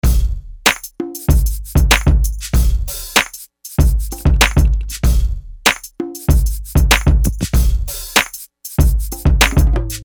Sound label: perc